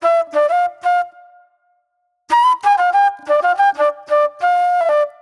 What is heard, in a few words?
Soul-Jazz Flute, Call And Response